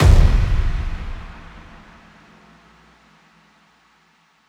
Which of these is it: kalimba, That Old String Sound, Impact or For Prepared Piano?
Impact